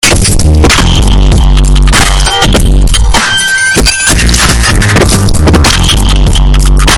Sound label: bass boosted; gta